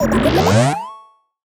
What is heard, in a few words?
Retro FX; Cymatics; Video Game Sample Pack Vol 1